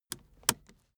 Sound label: car seatbelt unfasten soft